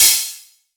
long open high hat sound e key, Royality free open high-hat sample tuned to the E note